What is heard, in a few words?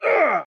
man injured grunt; soundscrate